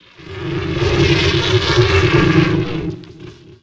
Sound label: Roar; The Elder Scrolls III - Morrowind